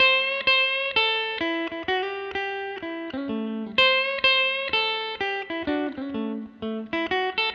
Blues Notes, Guitars; ACID Music; Tele Dreams

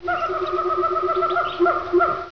Monkey-like bird; Macworld (1998-05); Games World; Triazzle 1.0 Demo

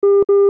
ALERT

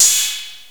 Analog Cymbal; XG

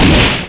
ion explode; PC Gamer IT CD 41; Daikatana

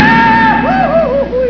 Goofy; SpookHouse

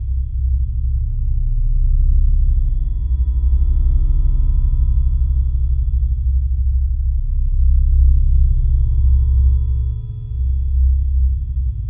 space tone

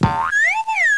Birds

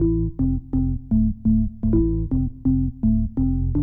ORGAN HOUSE 125 A; eJay; Keys

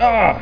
Death Scream; Blood Bath 1.6 Demo

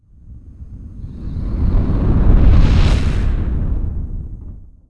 Asteroid; ImpGal2

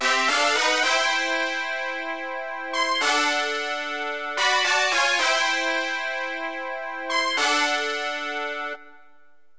bras; Roland; An Invitation To The Roland World Of Music

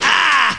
ahhhh

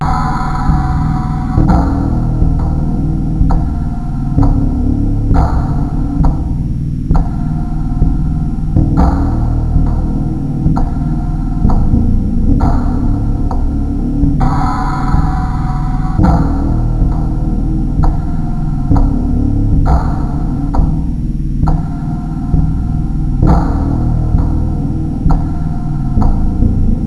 Slave March; Lab-Rat v2.0

